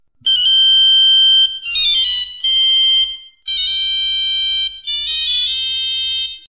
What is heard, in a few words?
3D Message Sound; S5